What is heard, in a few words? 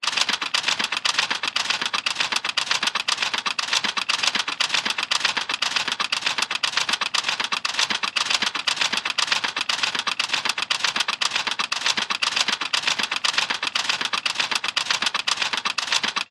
public places roller coaster ride pull lift pulley winch clatter constant; designed; zapsplat